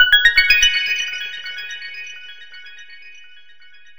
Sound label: Winline; ClubFusion; Super Fruit Chutes